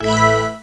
short email

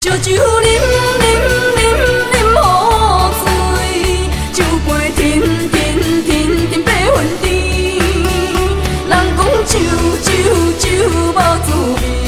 hokkien song

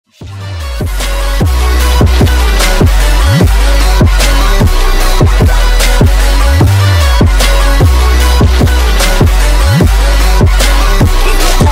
trap

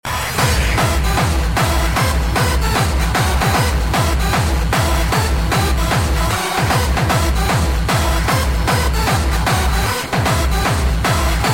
hardstyle

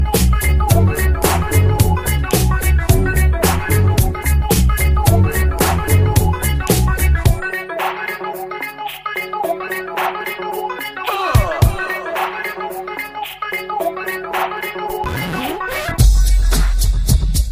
dance beat

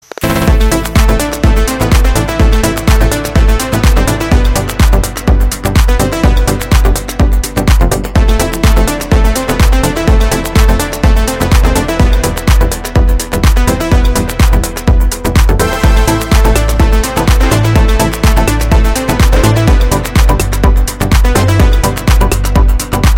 droid music

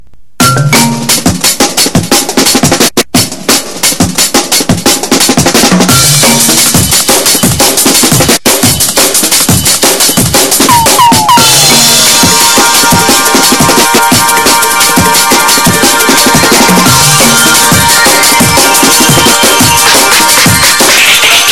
nokia bustle

